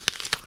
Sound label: eat; tortuga